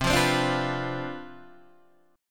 C Major 7th Suspended 2nd Suspended 4th C down Guitar Open D, chord